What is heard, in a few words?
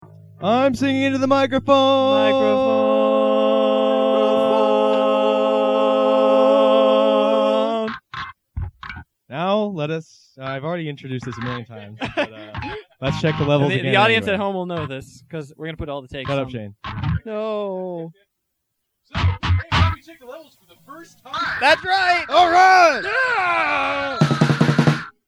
singing into the microphone